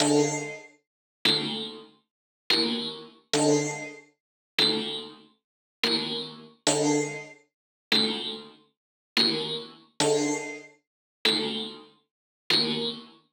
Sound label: Atmo D Dry